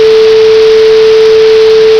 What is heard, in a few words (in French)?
signal bruite